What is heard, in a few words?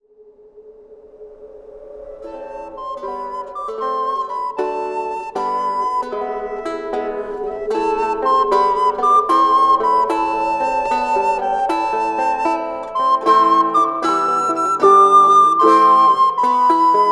Basque Carol